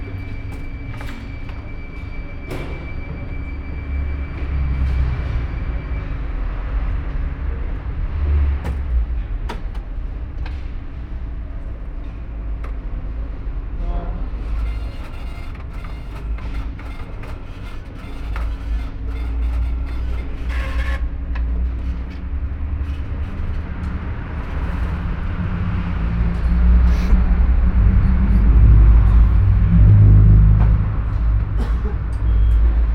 London, Drinnen und draussen

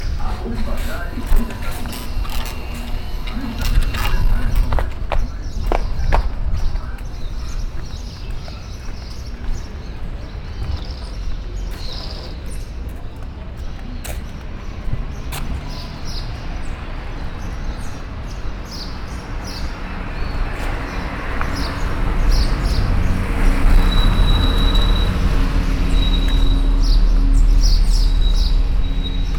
morgens St.Cecilia unterm Minhocao, Fieldrecordings Brasilien

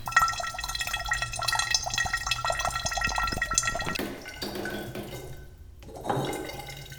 wassertroepfeln; Fieldrecordings

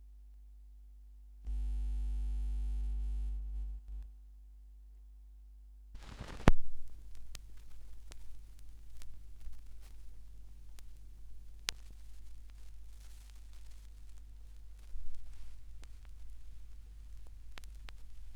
Schallplattengeraeusche; rauschpartikel

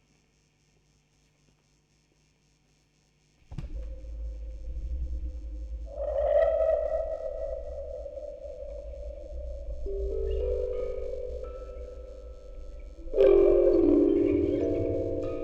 Aufnahme Rode NT4 Tape out Vocoder, Spieluhren-Quartett; Tonband; Fieldrecording

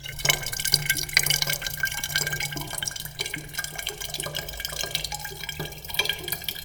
wassertroepfeln; Fieldrecordings

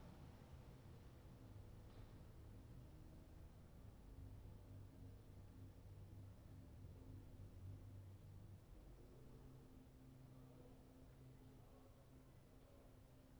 Regen auf Fensterbaenken; 6 Kanal; Fieldrecordings

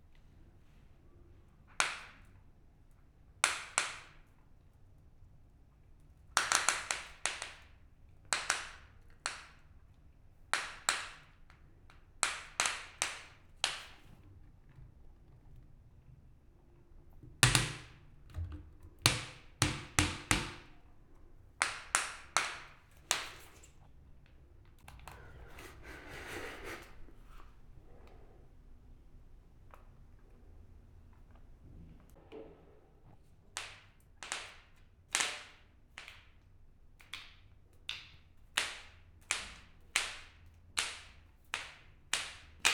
Knacksen

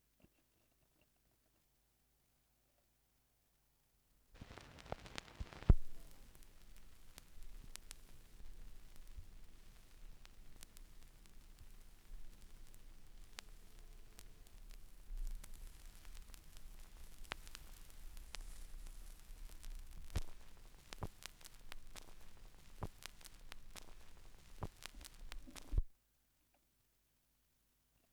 schallplattengeraeusche

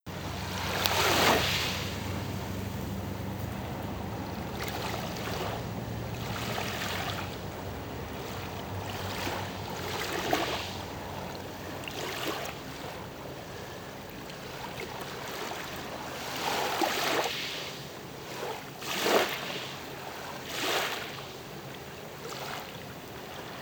Strandspaziergang; Greece; Perifereiakí; Original Video Ton; Fieldrecordings

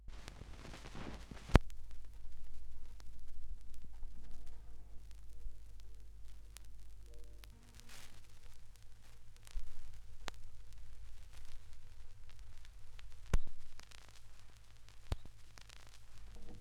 schallplattengeraeusche; rauschpartikel

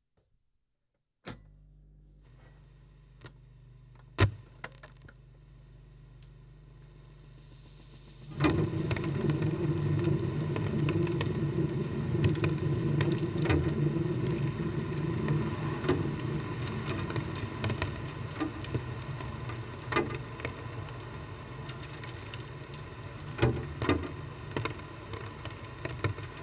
Echo Mode 2 (Lautsprecher Tonband), Trommeln im Regen Tape Echo Mix

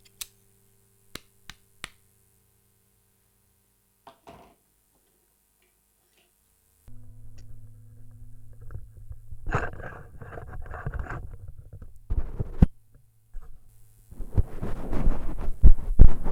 Nebengeraeusche; 3. Probe; rauschpartikel

